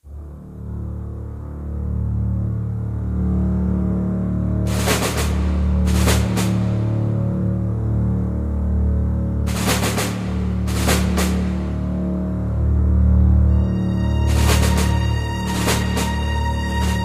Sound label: Droning Bed W Snare; World War II Combat: Road to Berlin; Xbox